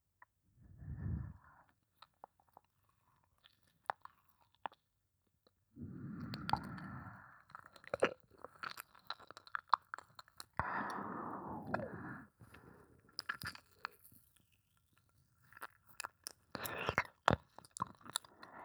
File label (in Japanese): 耳舐め・弱・左耳